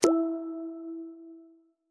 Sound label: leaving